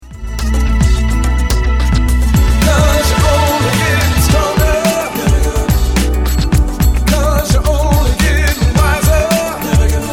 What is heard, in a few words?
Smooth Groove